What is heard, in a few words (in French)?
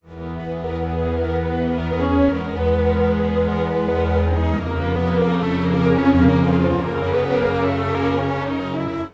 pitchshift strings; celemony melodyne